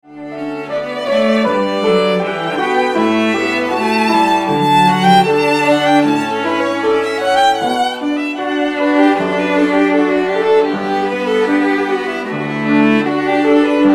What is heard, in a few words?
Canon trio; cut halfway